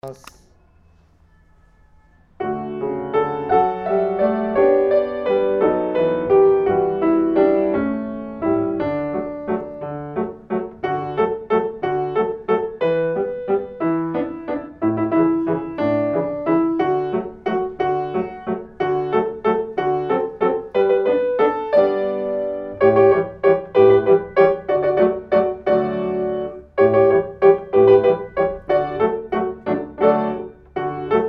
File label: accompaniment